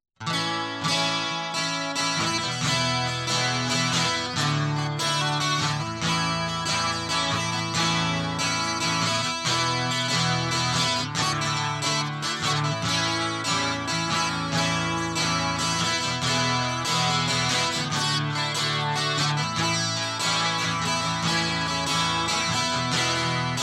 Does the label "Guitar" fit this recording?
Yes